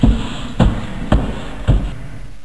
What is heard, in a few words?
STEPS